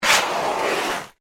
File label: Shovel Scoop Mud; Yard Tools; Sound Effects:Tools; BlastWave